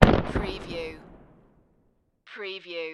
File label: Firework Close